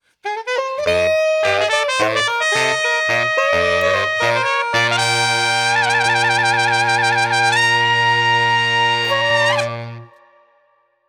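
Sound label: Street Brass New Orleans Transitions, 95 bpm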